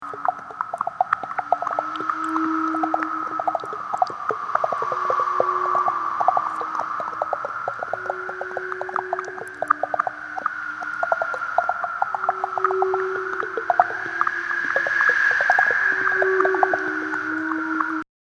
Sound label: Trees, ringtones